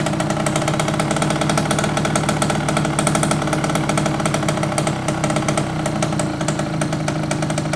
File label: ysr tcr twin idle; YSR pipe